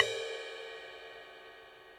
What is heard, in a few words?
CRASH 18 side stick atmo, Cymbal; Ableton; Factory Packs; Drum Booth